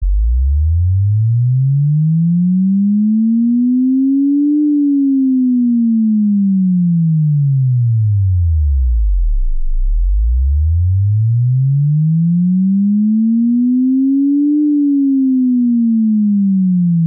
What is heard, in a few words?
Swept Sine 20-300Hz